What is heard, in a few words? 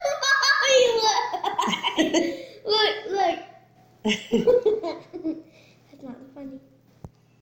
laughing